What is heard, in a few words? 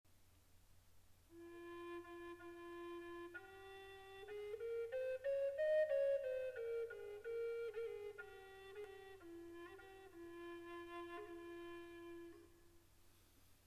flute triple contre bass do fa 432; triple flute amerindienne contrebasse do fa fa